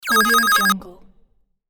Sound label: interface ringtone mobile arp beep; interface sounds